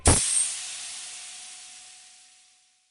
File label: Pipe Crack